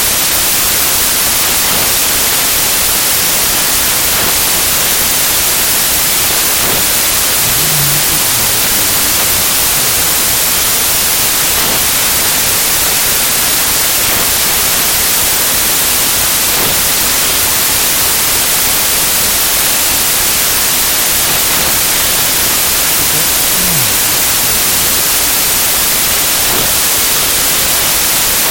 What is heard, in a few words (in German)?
NYC (soundsystem) 145 bpm, Kurzer Loop für die ausgeflippten Momente im Leben, Elektro mit Acid Jazz Allüren